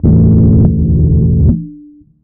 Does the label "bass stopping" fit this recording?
Yes